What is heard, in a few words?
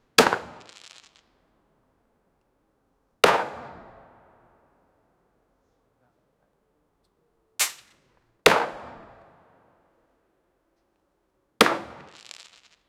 sound of russian weapon a, akm